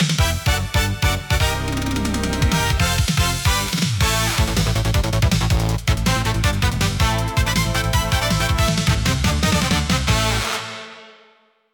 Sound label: kirameku fanfare long; free jingles